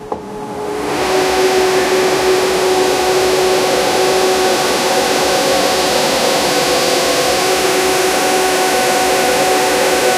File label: upholstery cleaning